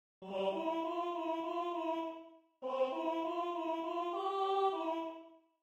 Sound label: alto